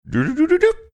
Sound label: singing series of ascending notes